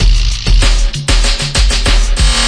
clutches candy, drum loops and breaks, 97 bpm; 091 to 100 bpm

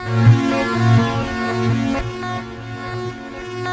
synths gone fishing; LOOPING AMBIENCE

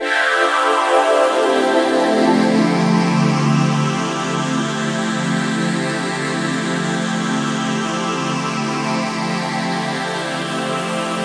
serious seventh synth trip; Pads

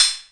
Korg KPR-77

